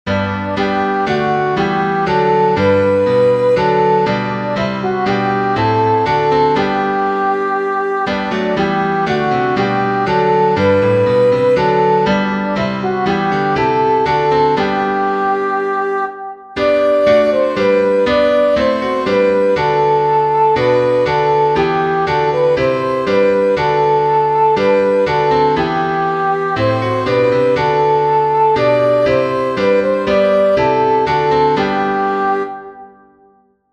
gott vater sei gepriesen soprano; GOTT VATER SEI GEPRIESEN Limburg Gesangbuch, 1838; “O God, Almighty Father”

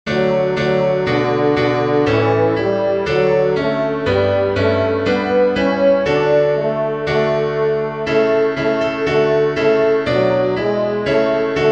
nicaea tenor; Tune by John B Dykes (1823-1876)

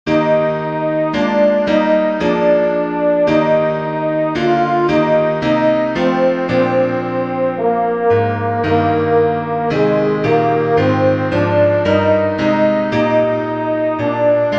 coena domini alto; Draw Near and Take the Body of the Lord

